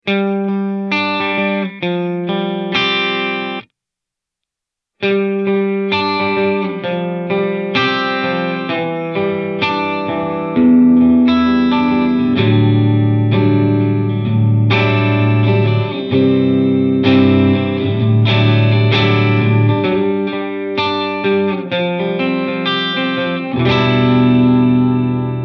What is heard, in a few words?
Spring Dark Short